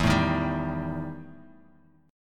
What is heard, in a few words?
E Minor Major 7th Double Flat 5th E; strummed; JGuitar